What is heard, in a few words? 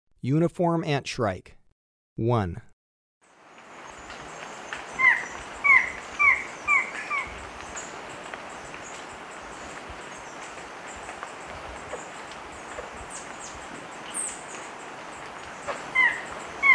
Uniform Antshrike; S. America